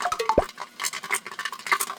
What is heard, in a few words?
popcorn; dota; crownfall